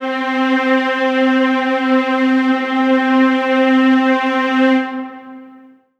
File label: String Ensemble C3; Aubit